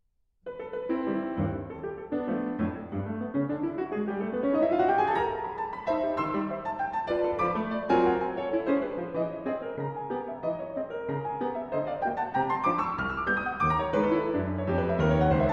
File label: Piano Sonatina, Finale